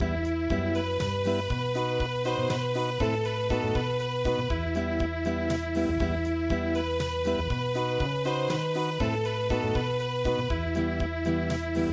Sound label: Garba Unplugged